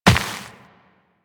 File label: Step Dirt, Footsteps Walk; DE; Warframe; Tenno